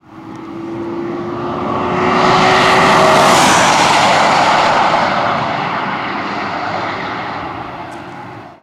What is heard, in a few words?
whoosh